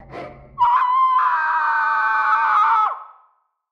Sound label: Scream Japanese; raw game audio files; Mita; MiSide